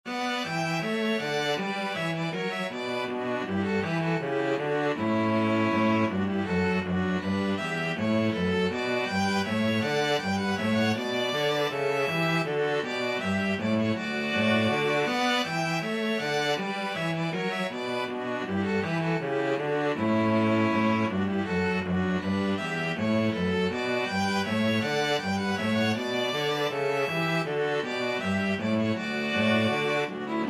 bourree string trio

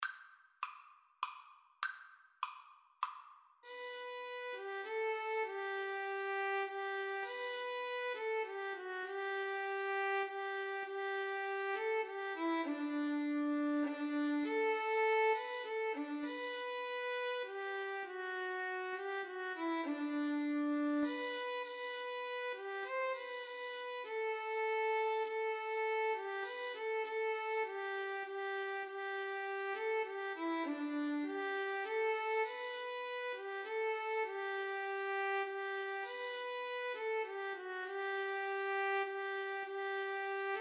i need thee, Violin-Cello Duet

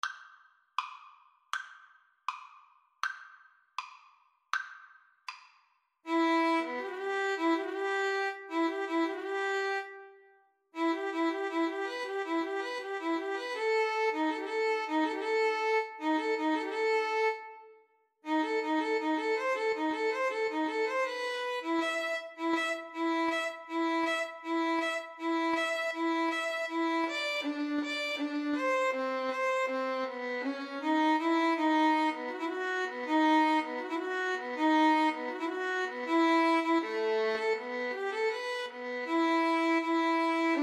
violin duet

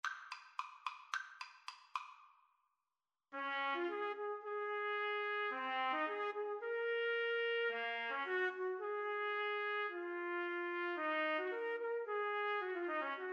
trumpet duet

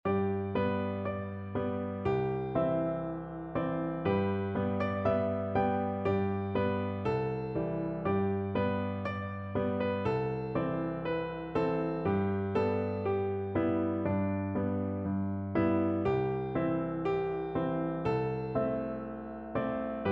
piano, piano duet

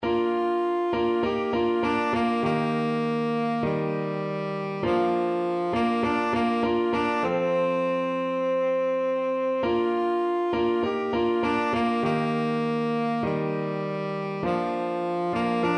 tenor saxophone

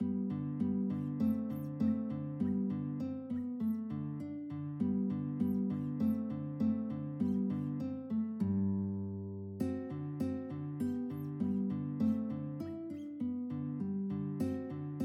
country dance, guitar; carulli

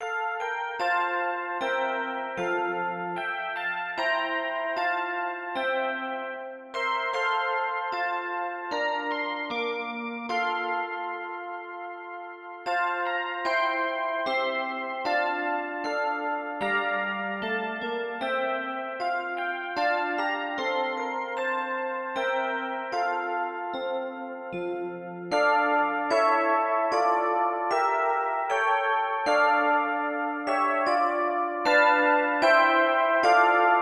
handbells

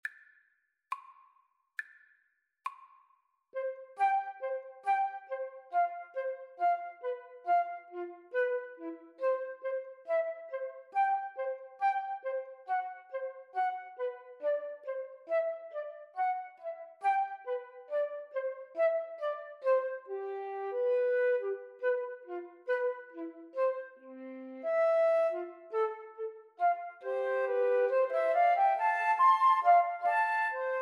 schubert rose, Flute Trio

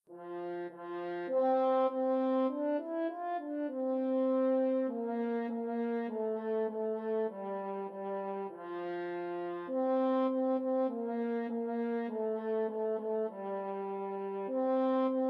Baa Baa Black Sheep, french horn

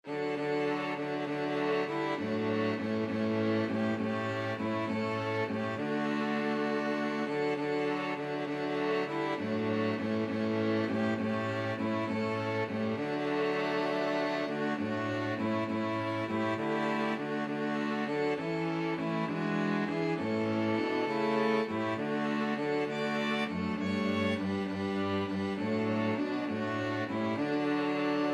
faith is the victory, string quartet

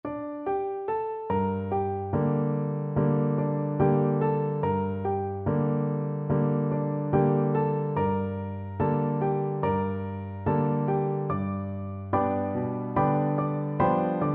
piano, piano duet

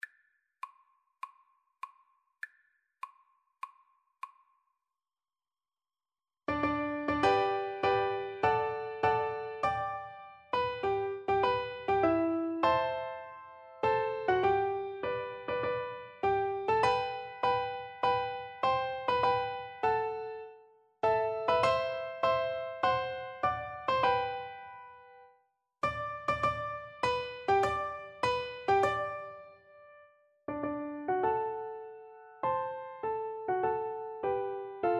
piano duet

